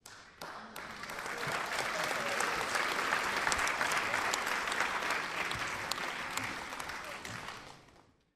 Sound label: human audience theatre applause